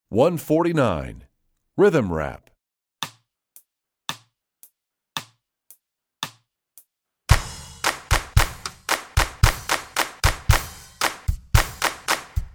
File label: Rhythm Rap, Strings; EE2000 Book2